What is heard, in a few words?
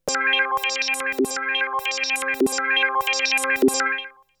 Melody oneshot; ZERO G - Datafile DISC 2